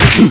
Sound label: bump; quake2; ranger